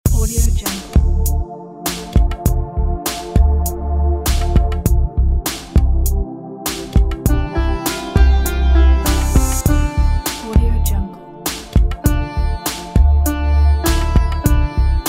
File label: ethnic fusion